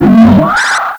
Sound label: hunter pain; npc; ministrider; Half Life 2; Episode 2